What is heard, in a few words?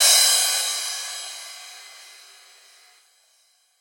Riddim Crash; One Shots; Hats & Cymbals; Ghosthack; Riddim Essentials 2020